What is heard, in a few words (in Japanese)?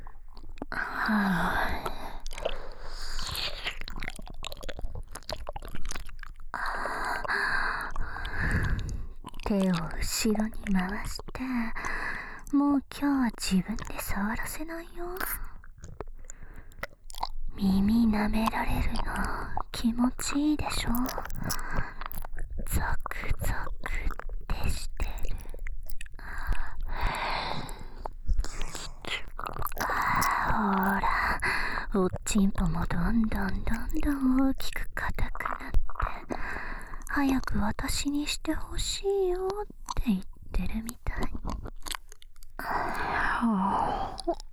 サキュバスの深〜い耳奥舐め; 30分間サキュバスの深〜い耳奥舐めに身を任せて脳イキを堪能できる音声